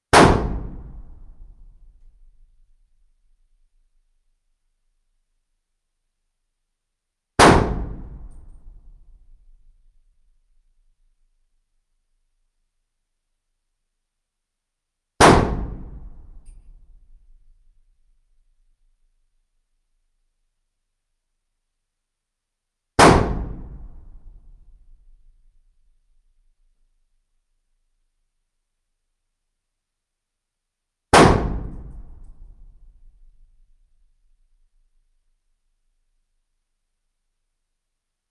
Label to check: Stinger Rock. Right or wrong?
Wrong